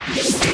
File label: Spell Block; RolePlayingGame